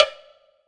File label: WBLOCK LW, Drum Mashines, SR-16 DRUMS; EdgeSounds